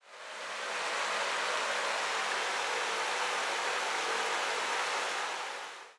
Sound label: rain loop